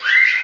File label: bird